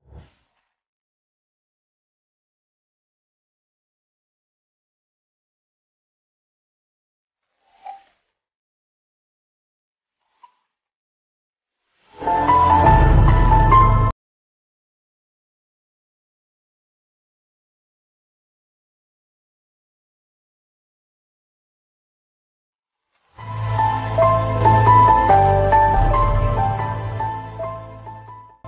bgm instrumental ringtone

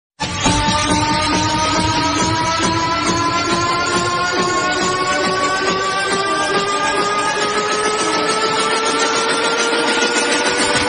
loud bgm ringtone bass boosted ringtone

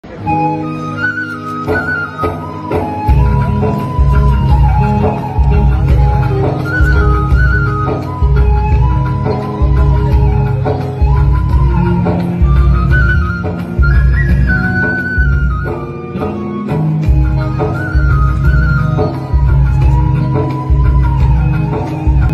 flute ringtone; jackie shroff

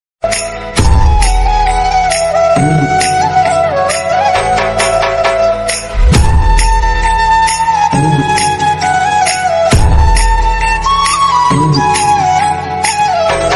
navratri instrumental ringtone

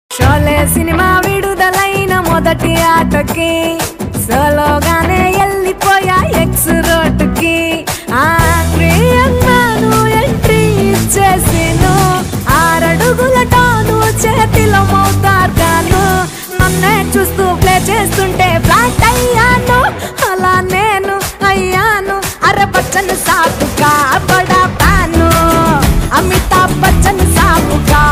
song ringtone, telugu bgm ringtones; chor bazaar movie; akash puri

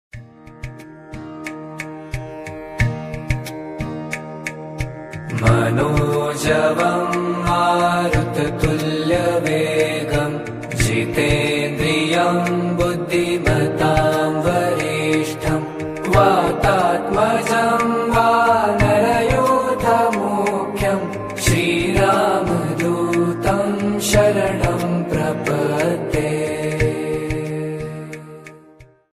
Lord Hanuman mantra

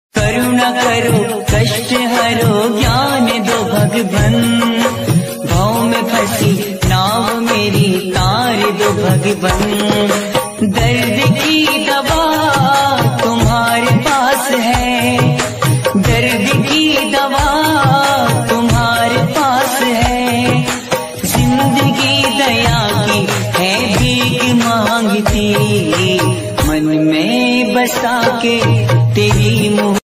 bhakti ringtone

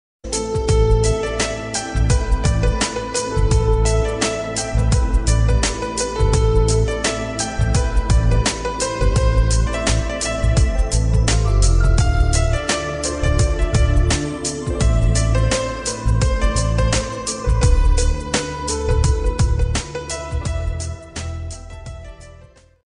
instrumental ringtone; ghajini

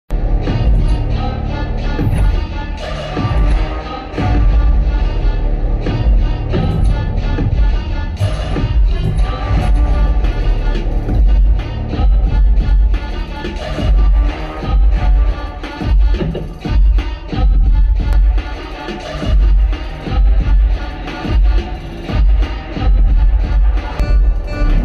hero entry bgm; calling sahasra movie; sudheer

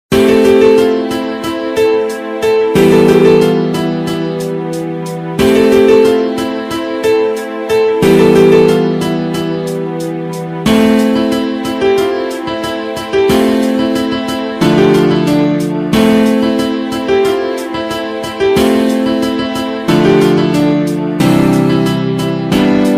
instrumental bgm ringtone telugu

